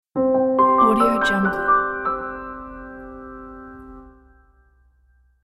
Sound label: simple piano logo; fredlmusicproduction